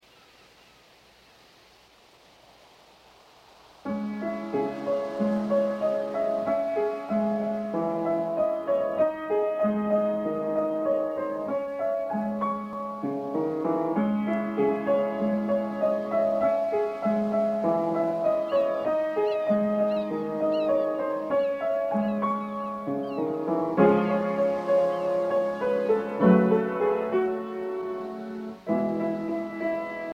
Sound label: pieces for piano